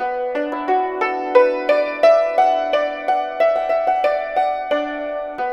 YNCHIN 90 D; INIS - Opium

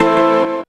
Key Stab OS; Sonic Foundry; Rondo Brothers Trip-Hop Loops & Samples

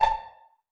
STRING PIZZ, PIZ A3 PP; Orchestra; Sound On Sound - Peter Siedleczek's Orchestra

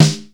Snare, EMU Proteus

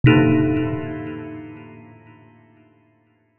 Atmos Sting; musicradar

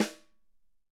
Wood Snr; Digital Sound Factory

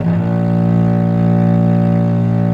A#0, VIOLE, Historical Instruments; USB Soundscan vol.23

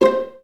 VA PIZZ C4, Orchestra; Best Service; Peter Siedlaczek's Advanced Orchestra

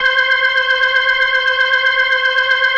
C5F, B3

